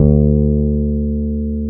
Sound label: FEND P-BASS D2